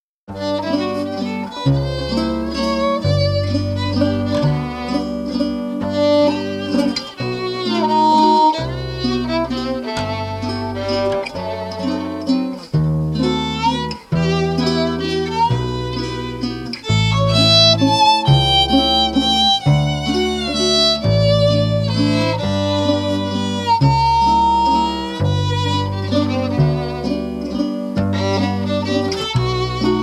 Waltz

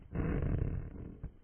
Wood Creak; Lethal Company

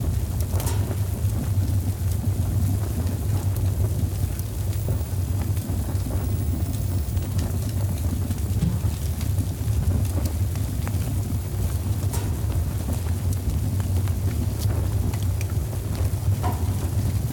stove

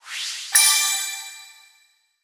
Card Over Drive

